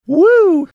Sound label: whoo